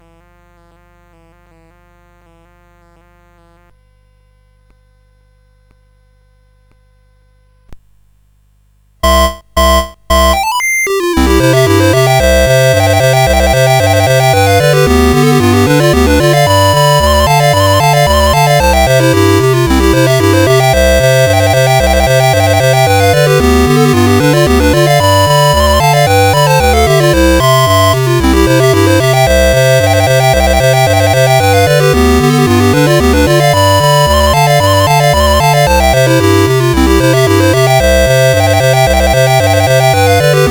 Clarinet Polka, CSG8580R5

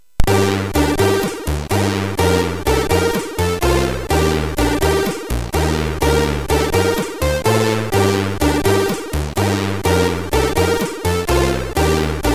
Sad Song, MOS6581R3

